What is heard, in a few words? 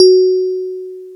DX PIANO